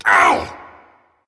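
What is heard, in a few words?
non verbal ouch, voice; cernunnos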